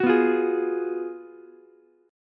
Gb Major 7th Suspended 2nd Suspended 4th Gb Ukulele; strummed; JGuitar